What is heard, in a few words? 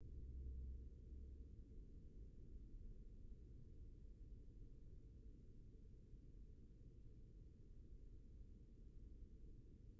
recording noise floor; TLV320AIC3254